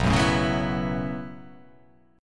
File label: B 9th Suspended 4th B down